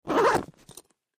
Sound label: Zipper Close; zippers